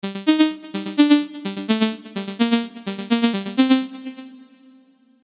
Future Dust; Serum

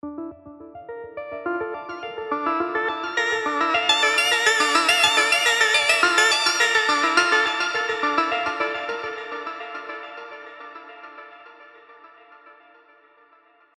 Synth Loop D 105 BPM; Spire; Best Friend

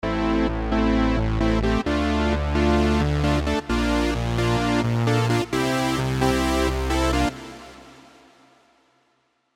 Classic Poly; Serum

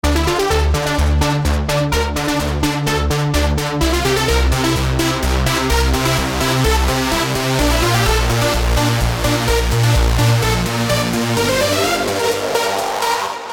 lead, Hyper, Spire trance leads

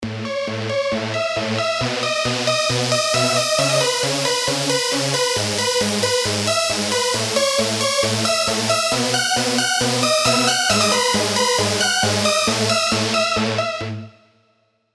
Gospel Trance; serum super saws

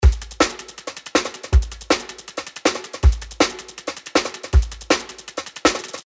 Breaks (160 BPM); Sorority; JUNGLEJUICE

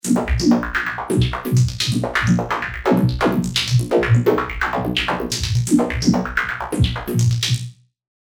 Synth Loop B 128 BPM, modular; Sylenth1; i haz modular

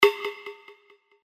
Perc Generator; spire trance leads

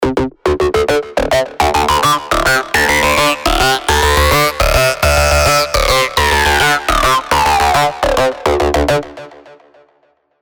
Acid Loop D 105 BPM; Spire; Driving Force; ACIDVSLASERS1

